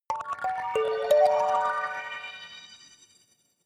notification kalimba magical sequence major

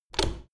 door plastic handle pull down hard

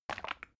bottle syrup upend; berufe; gastronomie

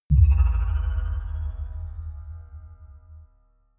sci fi bell bass

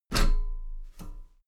step metal ladder step top regular

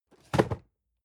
cardboard box medium fall low; objekte

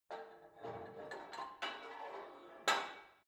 put weight onto barbell unsteady

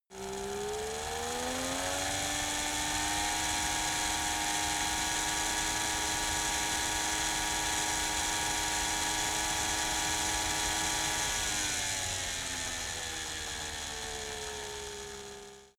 car saab 92 rpm high engine

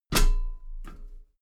step metal ladder step top heavy

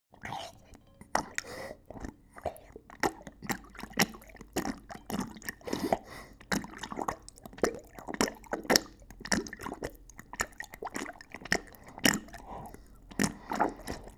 dog drink; natur-tiere; hunde

